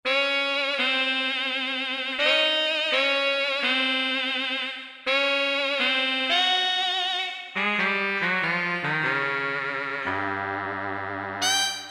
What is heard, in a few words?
Bend Sax Voice, Roland JD-990; Factory Patches